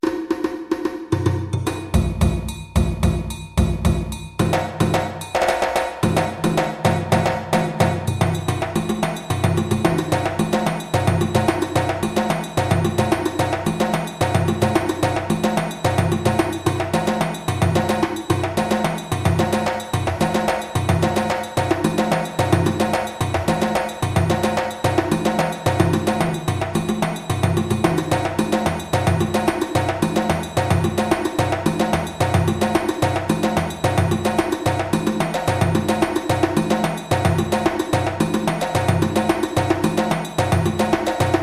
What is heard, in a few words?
Soli Rapid Arrangement 220 bpm, djembe